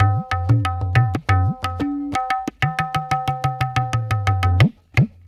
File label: Tabla